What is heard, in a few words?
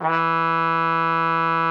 TROM E3, TRUMPET; Xsample VOL-3